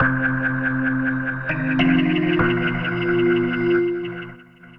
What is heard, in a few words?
organ